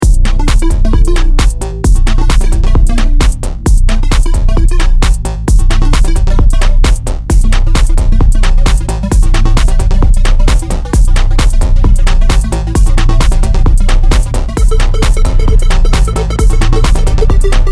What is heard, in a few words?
song; space game